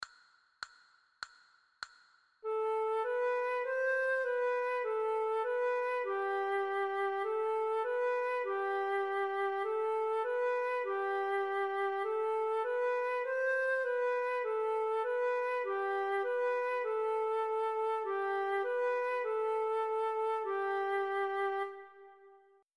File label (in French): le carillon de vendome, flute a bec